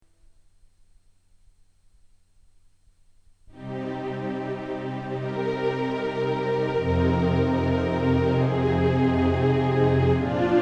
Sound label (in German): DP7U Streicher